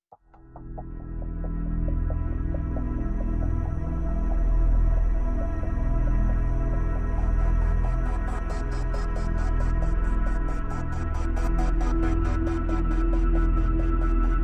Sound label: Pads and Pulses